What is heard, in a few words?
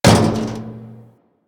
Physics Barrel Impact Medium, Metal Barrel